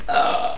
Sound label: burp